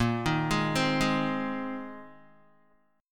Bb 9th Suspended 4th Bb down Guitar 4F,4A,5C,5G,5C,6E; arpeggiated